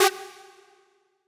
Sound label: synth